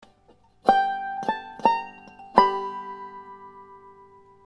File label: ending